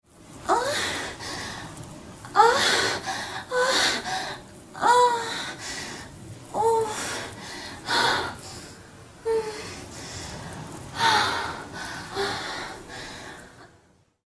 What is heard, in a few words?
ejaculate female